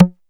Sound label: CONGAS HI, DRUMS; Music Store Sound Service; Einstein